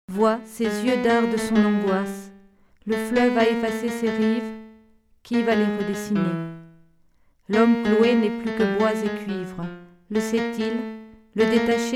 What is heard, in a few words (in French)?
voix piano